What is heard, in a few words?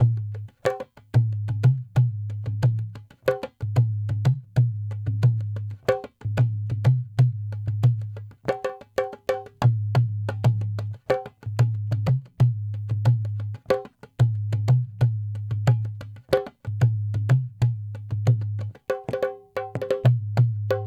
dholak 092, Hip Hop India; Hip Hop India Modern Percussion